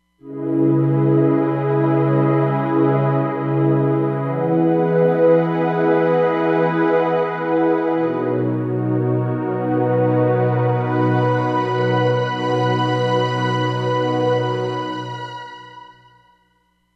Phat Pad, Roland Fantom XR

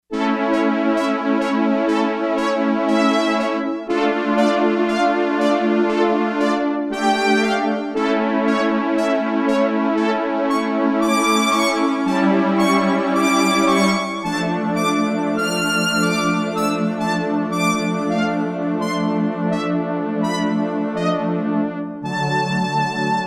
Live Strings, Yamaha TX802; Performances; Factory Patches

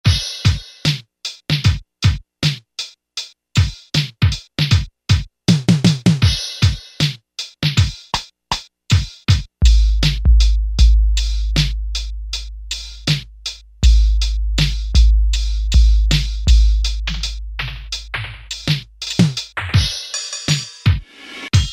Kit, Alesis Z1 Hip Hop; Espy Kit

